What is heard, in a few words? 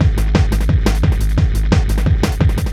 morphex 175bpm